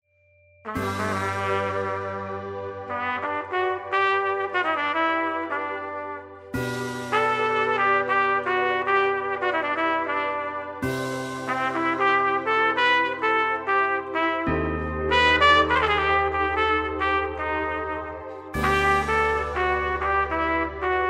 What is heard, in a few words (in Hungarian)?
trombita; adventi